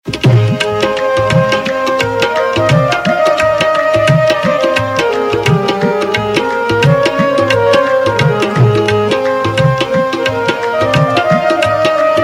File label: Instrumental